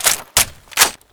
scarh clip in; garrysmod

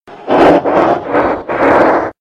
teleportation; freak fortress 2; nightmare

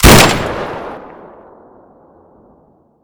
shot, weapons; garrysmod

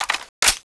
m16 clip in; garrysmod

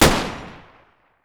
vollmer fire; mg; garrysmod

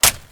scarh mag tap; garrysmod

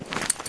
g3 deploy, weapons; garrysmod

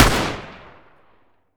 m16a2 fire, weapons; garrysmod

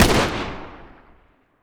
m60 fire; garrysmod

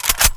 auga3 bolt pull; garrysmod

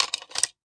clip fiddle, weapons; garrysmod

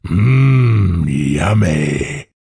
mmm; cstrike; war3source

